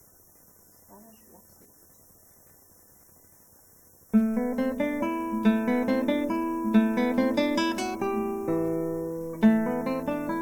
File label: Guitar; Spanish Rhapsody; Scenic Music for Guitar Beginners